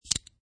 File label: USB Retract; Sliding retractable USB thumbstick out - Variation 5; Computers; Michael Huang